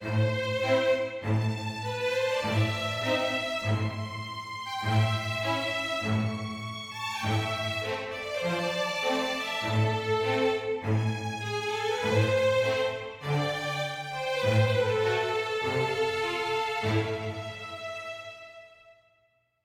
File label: mysterious forte; Quivr; PS4 Windows gamerip 2016